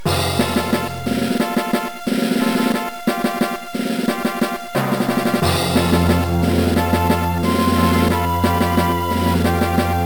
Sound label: battle fanfare; gba; Advance Wars